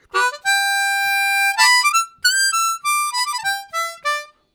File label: Harmonica, WAV Riffs; Zero G